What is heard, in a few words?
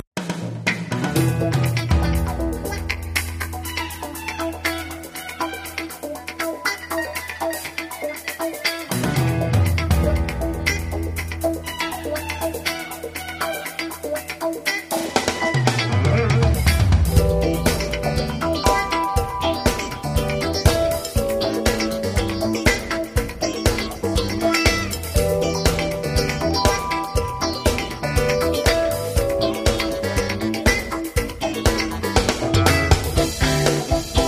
DISCO BOOGIE; Cue Palettes; Disco Cues